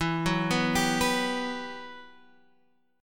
G Major 7th, E; Gmaj7/E; arpeggiated

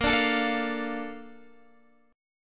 Bb Major 7th, Bb, down, Guitar, DADGAD

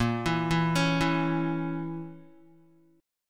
Bb Diminished Bb Guitar; arpeggiated; JGuitar

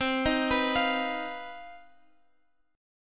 C Minor Major 7th Double Flat 5th, Guitar, Open G; down; chord shape

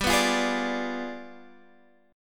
G 7th Flat 9th, Guitar, Standard

